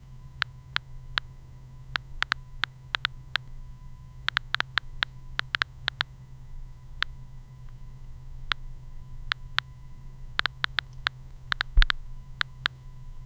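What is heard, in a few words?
text popping up soft japanese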